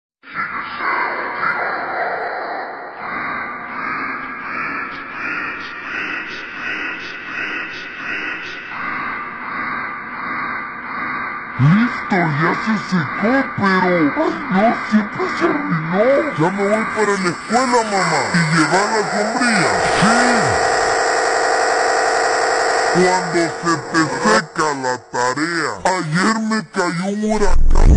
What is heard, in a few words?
phonk slowed